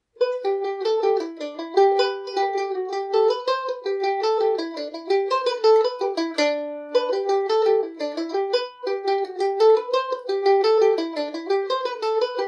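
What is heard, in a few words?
D mixolydian, Irish mandolin; give us a drink of water revisited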